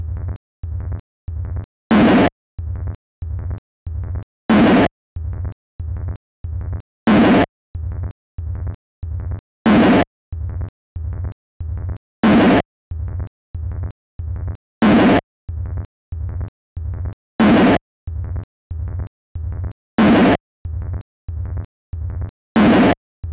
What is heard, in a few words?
Sinewave